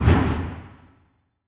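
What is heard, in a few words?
mystery symbol animation start; goldengrimoire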